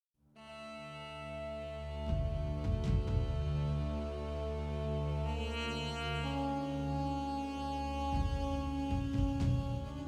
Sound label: Duduk